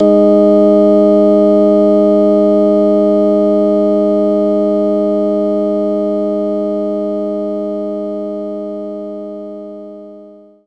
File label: Hammond Organ; knights try